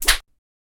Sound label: fouet whip